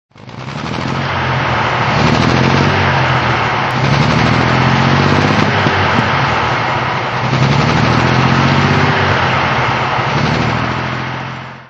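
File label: buick master six throttle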